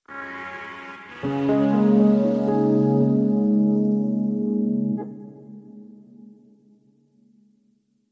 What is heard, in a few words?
Emota Sting